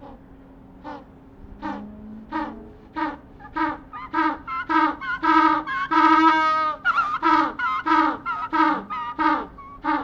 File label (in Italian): Vocalizzazione, pinguini